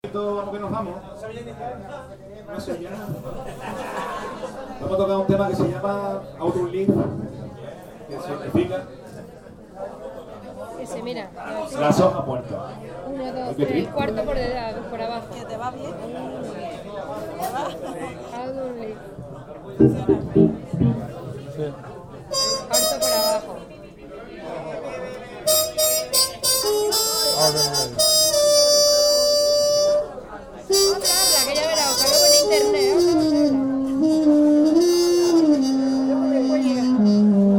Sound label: Jazz People